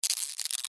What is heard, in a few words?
Insect Move Click, Insect, Movement, Clicks, Bug; Sound Effects:Animals:Insects